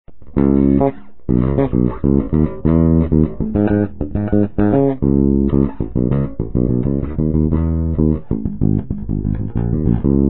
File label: fodera mid